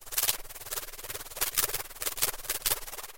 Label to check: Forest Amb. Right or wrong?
Wrong